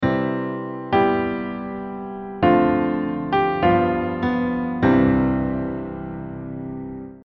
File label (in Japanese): スケールで考えるメロディー